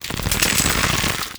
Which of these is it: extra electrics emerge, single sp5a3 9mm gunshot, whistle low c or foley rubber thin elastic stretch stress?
extra electrics emerge